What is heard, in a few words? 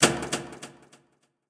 spin; tetrio